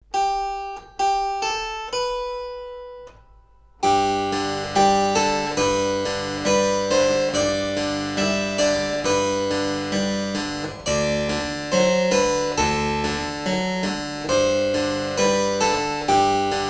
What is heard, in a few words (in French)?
Clavecin